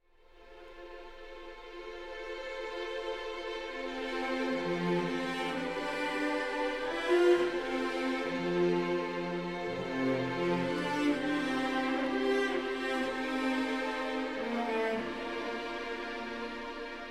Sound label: Orchestral